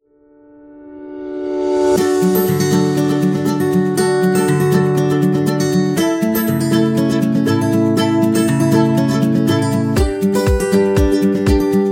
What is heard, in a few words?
Commercial Acoustic Guitar; happy music vol 7